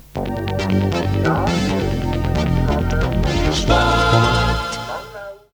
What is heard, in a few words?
jingle radio sport; raurach